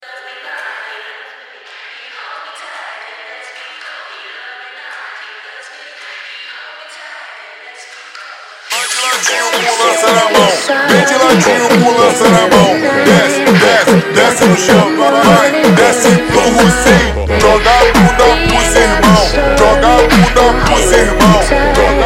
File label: Funk